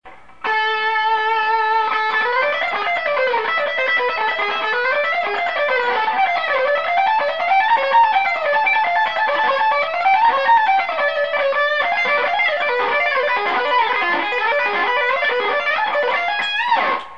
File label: baroque and roll bridge, Gitarre, Fender Yngwie Malmsteen Stratocaster